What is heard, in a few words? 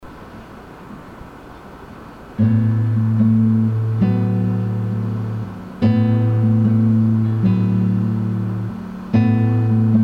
Guitar